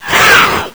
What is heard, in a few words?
pain; slender